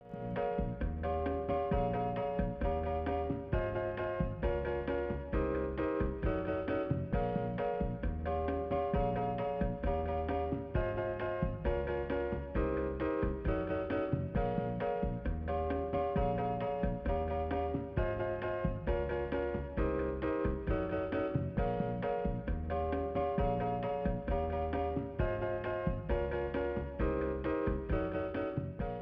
Elevator Music; Jurassic Park; SNES

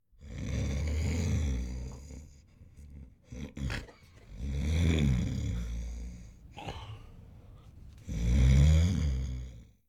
Snore; The 39 Steps

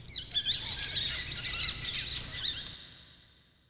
rando critter

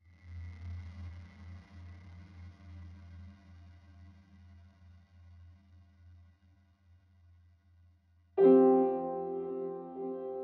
Hospital (Piano Layer); soma unofficial soundtrack 2015

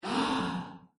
Badge; Yet Another Pixel Dungeon; Android

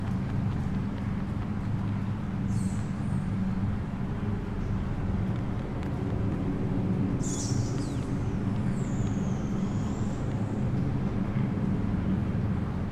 ambience - batcave; Lego Batman 3 Beyond Gotham

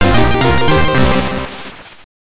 Experience Up; Spider-Man; GB

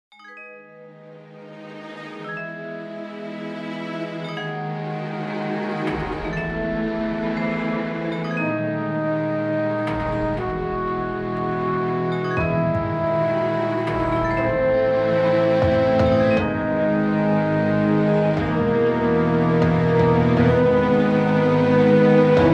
Music Phone Outro; Agent A: A Puzzle in Disguise; Windows

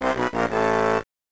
mini game fail; Banjo-Kazooie Grunty's Revenge

